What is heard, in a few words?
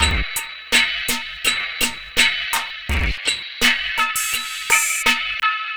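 Lo Fi Drum Loop 083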